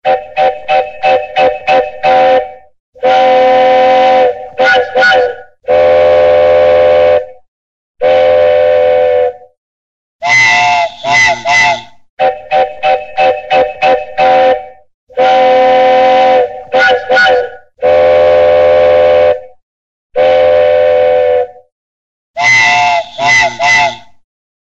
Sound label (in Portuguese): Locomotiva, som locomotiva passando